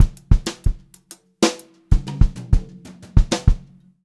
fill, Brushed Drum Loops jazzy 126; USB Soundscan vol.39; AKAI

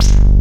TECHNO; Pro-Rec - Synthsonic; Z1 TECHNO

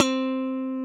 GTR, Funky Strat; 90's Sample CDs; Club-50 - Foundations Roland; Funk & Mute

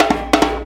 PRC HAND, Africa, Perc Loops

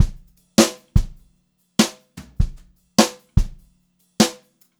Brushed Drum Loops, JAZZY; USB Soundscan vol.39; AKAI; 90 s Sample CDs

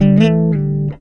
GUITAR

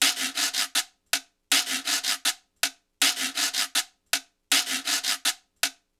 Quiro, Latin Percussion; Sonic Foundry (Sony Creative Software)

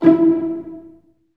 STR SEC, ORCHESTRA; Roland - LA Composer vol. 1

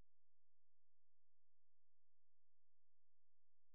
A#, Synclav FM; Big Fish Audio; SPACE1

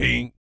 BASS FX, Vocal Planet, Jazz FX; Spectrasonics

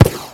Soccer Game Ambience; J-League Soccer Prime Goal 3

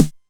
909 Snare, Novation Drumstation; Novation DrumstationV1

